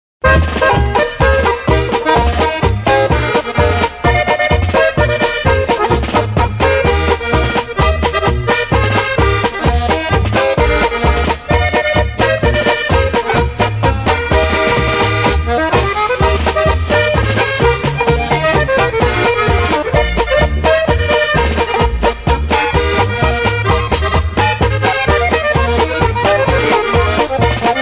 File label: Button Box Polka, accordions